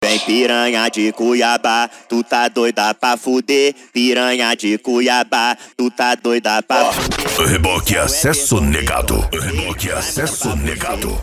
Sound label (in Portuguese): racha eletronica e funk mega bass